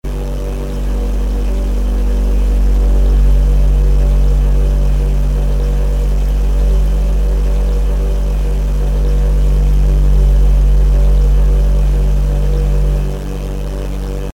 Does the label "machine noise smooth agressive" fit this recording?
Yes